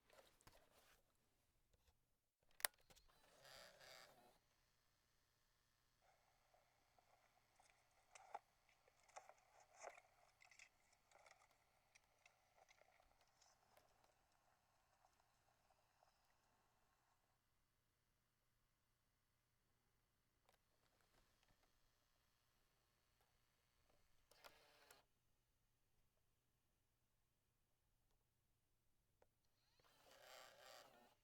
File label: transporting a camera